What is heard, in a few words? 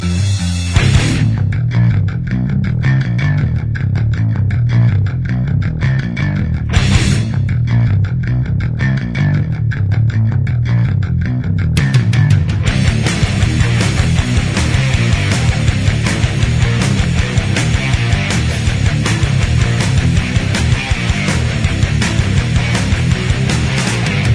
hard rock bass